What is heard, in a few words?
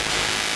Sci-Fi Alert